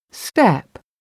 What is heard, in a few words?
step